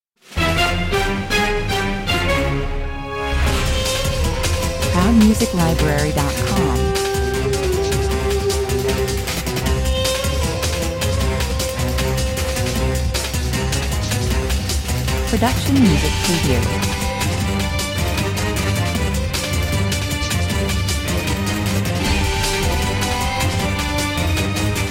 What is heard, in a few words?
desert drive 160 bpm